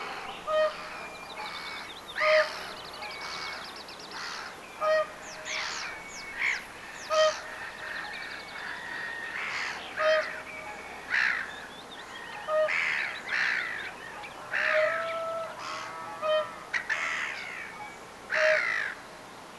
laulujoutsenen kuup tai puup aania

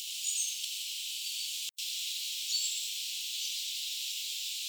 yhdessa kaksi mahd sen ihmelinnun aanta

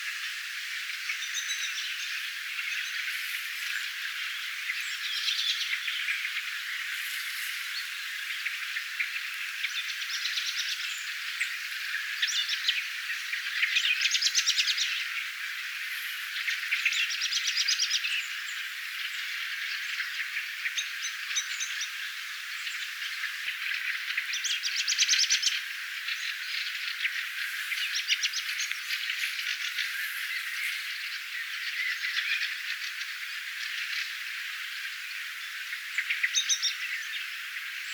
keltanokkarastaslintu

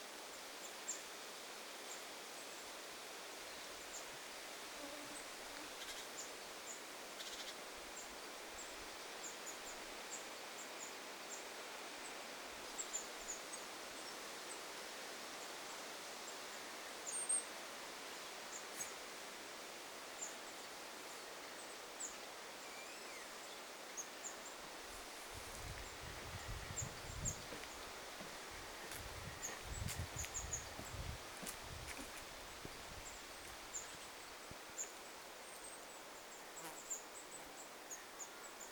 tuollaisia yhdysaania nuorilla talitiaisilla

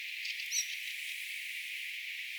tuollainen rakattirastaan lentovihellys

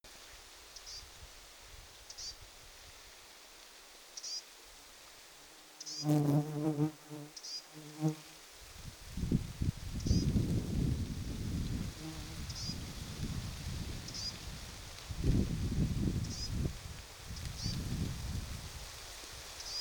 hernekertun poikasen huomioaantelya

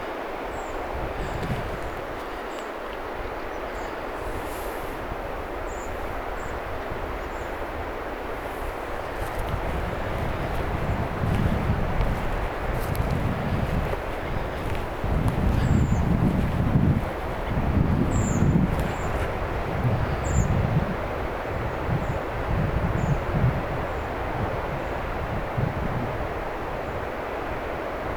jonkinlaisia punakylkirastaita ilm

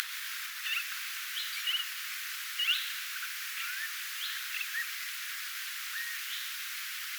ruskosuohaukkakoiraan aania

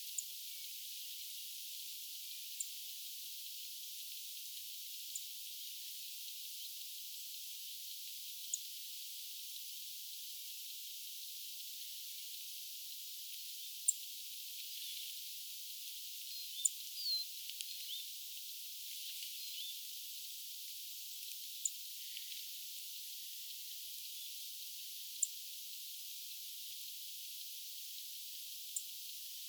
laulurastaslinnun tik aania; laulurastaan tik-ääniä

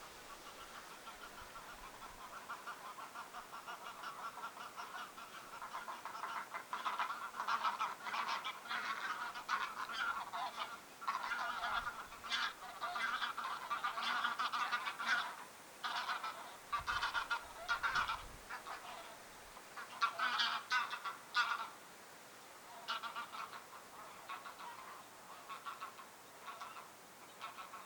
ilmeisesti tassa kotihanhiperhe nousee lentoo lentaakseen peltoaukeille

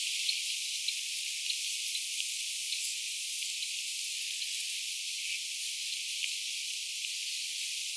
viiksitimalin aanta

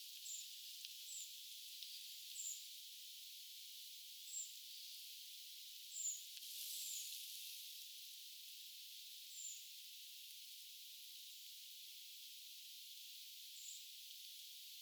punakylkirastaslintujen aania; huom viimeinen aani onko se keltanokkarastaslintu

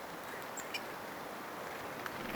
tuollainen punatulkun ääni

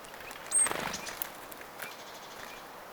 mikä laji olisiko kuusitiainen

